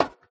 metal step; Golden Crayon Sounds

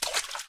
water step; Golden Crayon Sounds

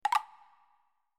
Folder Closed

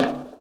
bleacher step; Golden Crayon Sounds

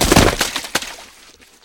mud fall; Golden Crayon Sounds

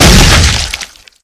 artillery hit; Original TK Sounds

